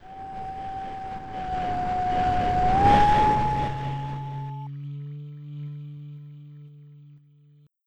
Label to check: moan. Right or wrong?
Right